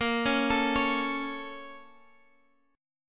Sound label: A sharp Minor Major 7th, down; arpeggiated